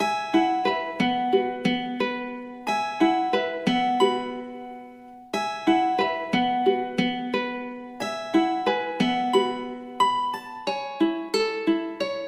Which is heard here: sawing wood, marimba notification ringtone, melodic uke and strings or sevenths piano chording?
melodic uke and strings